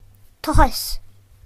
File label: scratch; gcompris-data